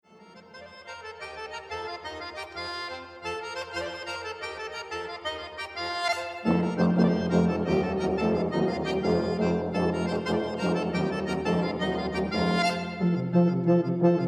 Eastern Folk Dances

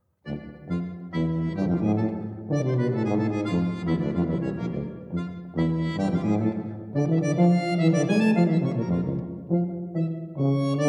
Eastern Folk Dances

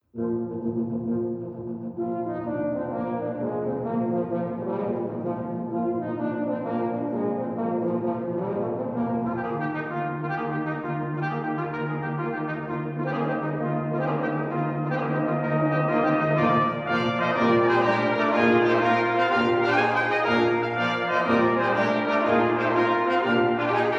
Brass Quintet Scottish Jig

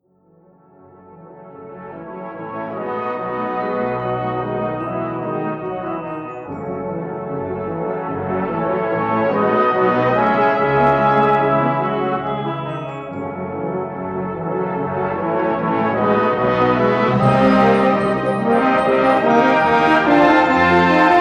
Brass Band

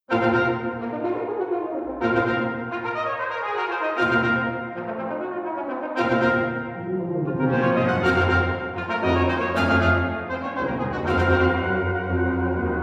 Brass Quintet March

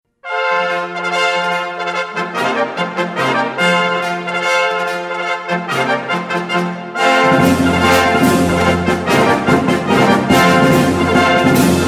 Brass Band Finale

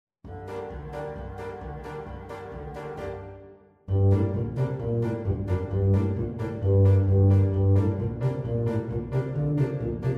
In a Klezmer Style

